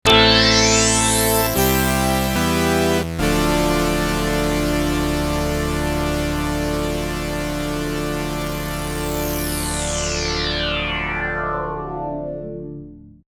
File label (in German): P600 Sequenz; gligli